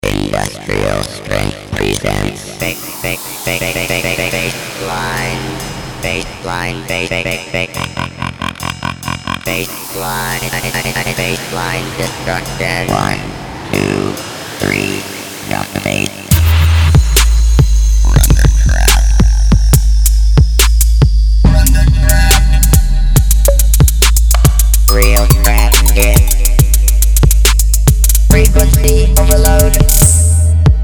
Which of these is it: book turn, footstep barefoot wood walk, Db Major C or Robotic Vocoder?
Robotic Vocoder